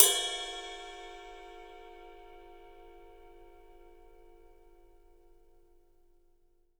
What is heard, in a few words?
ride cymbal; 3D Sound; 3D Drums